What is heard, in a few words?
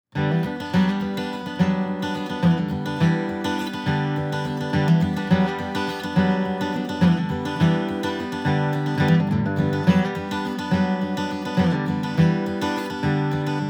clear horn